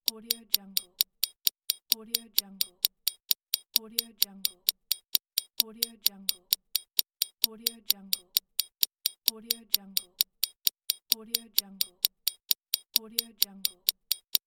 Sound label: clock ticking